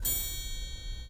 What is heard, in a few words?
triangle